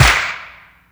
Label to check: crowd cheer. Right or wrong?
Wrong